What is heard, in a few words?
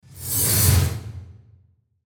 Whoosh Metallic, Whoosh Metallic Scrape Slice; Blastwave